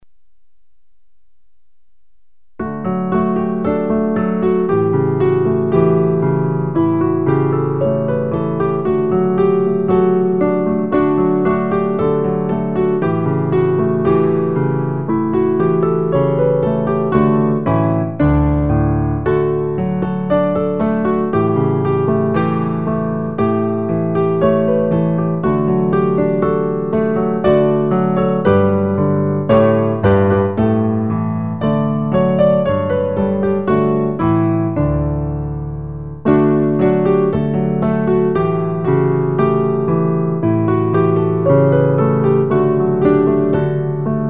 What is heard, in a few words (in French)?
piano